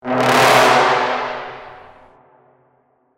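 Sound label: Sting Medium Brass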